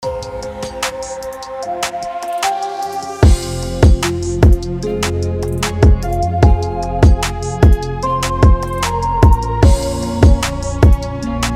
BED URBAN BEAT FMAJ. 150BPM

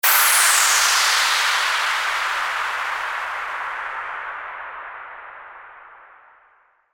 WHOOSH

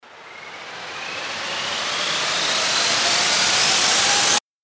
RISER

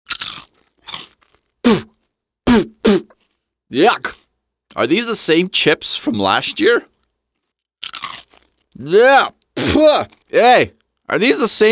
STALE CHIPS YUCK SPIT

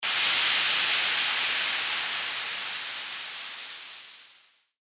WHOOSH

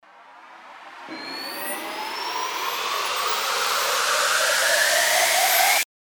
RISER

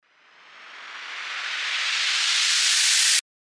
RISER

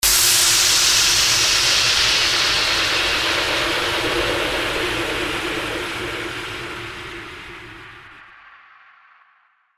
WHOOSH

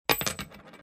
COIN

